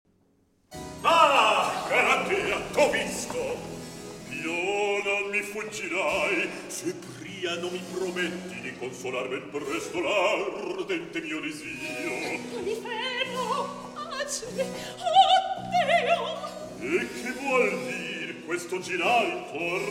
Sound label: Recitativo; Ah, Galatea, T'Ho Visto!; Polifemo